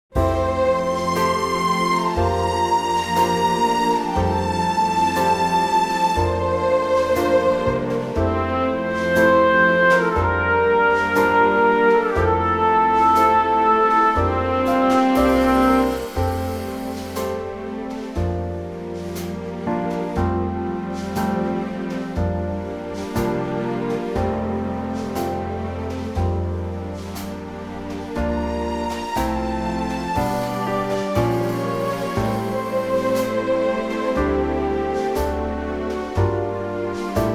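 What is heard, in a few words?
F orchestral; key - F - vocal range - F to A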